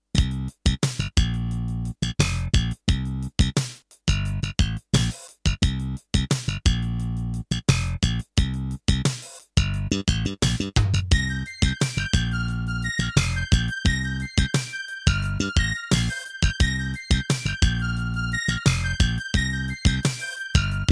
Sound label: Terratec WaveSystem SCW001-2